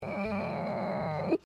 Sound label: screech held back